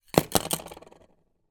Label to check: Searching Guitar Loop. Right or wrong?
Wrong